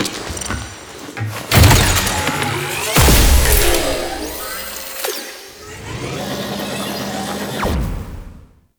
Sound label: Swarm Deploy Scanner; Entrati